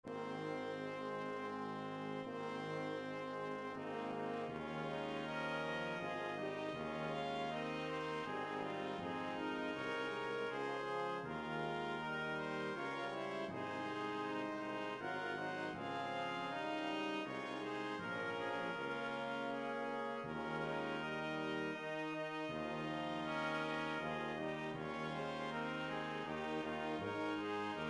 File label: Brass